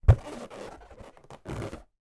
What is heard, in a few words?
foley balloon inflated handling